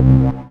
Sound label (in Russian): knopka cifrovoi shumnii zvonkii spokoinii